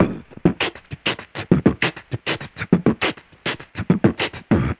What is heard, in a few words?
beatbox